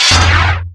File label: Relic Pickup